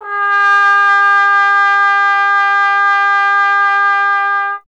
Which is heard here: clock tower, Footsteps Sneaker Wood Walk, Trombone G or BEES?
Trombone G